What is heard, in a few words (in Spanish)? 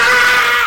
pedazo chillido